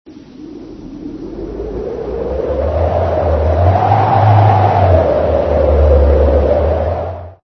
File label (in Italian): vento